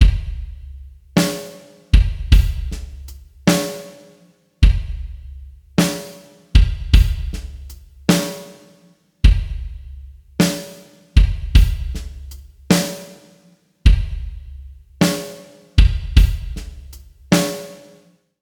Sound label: Elysia verb BLUMLEIN